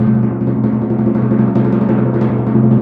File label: PRC TIMPRO, Timp Roll